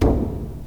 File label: DRUM L, ASIAN PERCS; Crypton - DrumBase5000